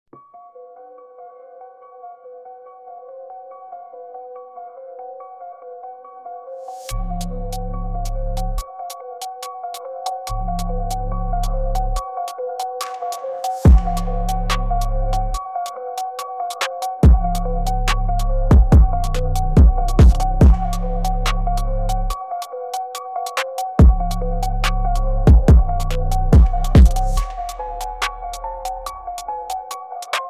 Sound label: drill beat